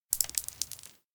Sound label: konpeito drop; anemoi